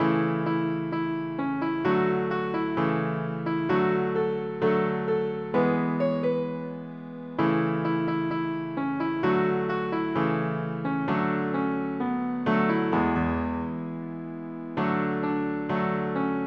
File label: piano; PSE Music; Sing Joyfully; normal tempo; PlayScore